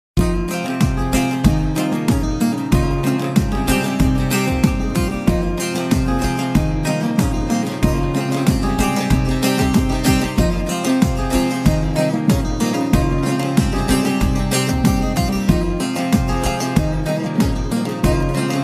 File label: Turkish Baglama